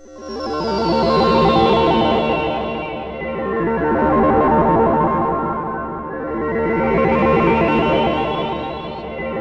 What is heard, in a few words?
FLOATING; Best Service Advanced Media Trax Building Blocks; WAR GAMES